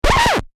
Technology Record Scratch; Cd Player Sound Effects; CD Players (Sound Effects:Electronics); SFX Bible 6

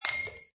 Metal Light ding; Metallic; Metal sound 55 Light Ding 1; Sound Effects:Multimedia

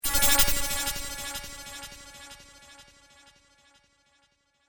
Ping Pong Laser Charge; Laser Sound; science fiction sound effect; star wars / trek style games; Andrew Fleming

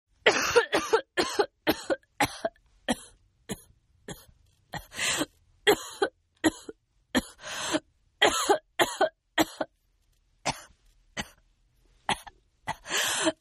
Cough Female; Sickness Sounds; Human

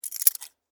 Key Chain Grab, Movement; Foley; Jangling (Sound Effects:Objects); BlastWave

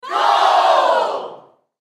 Human Crowd Studio; Crowd Reactions Goal Loud Short; Voice; Blastwave; Humans

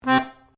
PIANO ACCORDIAN C3 SHORT, Old Piano accordian; Pianos; Musical

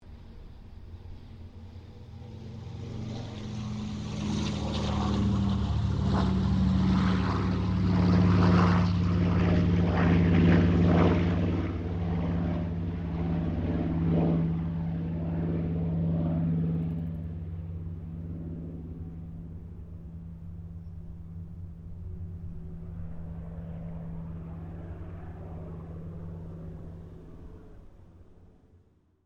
AIRCRAFT CA-13 BOOMERANG TAKEOFF; Airplanes; Vehicles

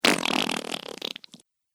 Dribbly Squelchy Sound, slimy sound effects

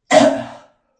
Gag Choke, A gagging choke; Gagging (Sound Effects:Voice)

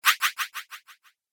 Vanishing Whoosh; Whoosh Sound Effects; sound design

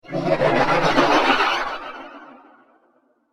Sinister Ghost Awaits; Ghost Sound Effects; horror; Andrew Fleming

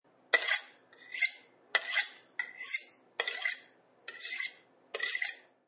Chef sharpens large knife.; Food Prep; Restaurants

